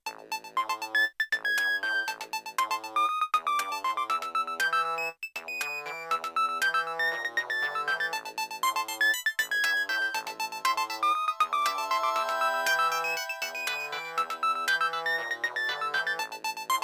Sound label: nokia 1208 ringtone; espionage